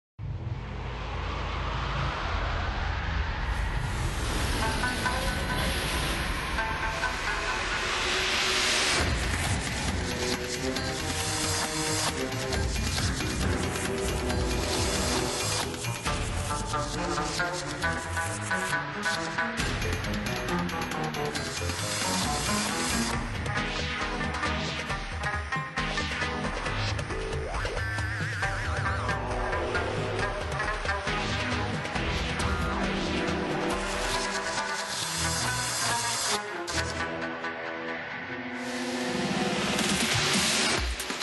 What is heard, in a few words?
Sandstorm; Terraria